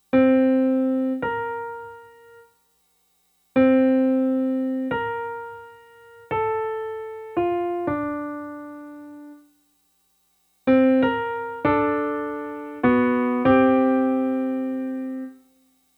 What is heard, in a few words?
minor 7th, P515